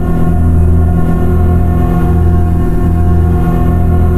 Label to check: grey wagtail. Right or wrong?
Wrong